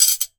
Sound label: cutlery